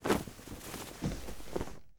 Idle Fabric

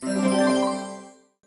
drop spell

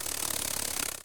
Flap

